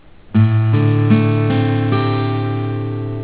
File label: chd d7su, guitar chord